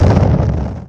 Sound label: break